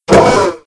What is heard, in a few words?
Autogun Door; Playable Tutorial Level